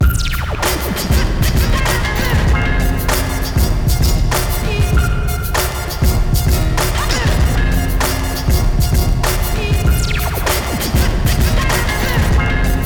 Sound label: Beat; NBA Live 2003; PlayStation 2